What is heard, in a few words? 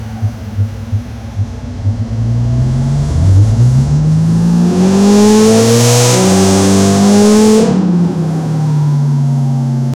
realistic bmw 3 series, exhaust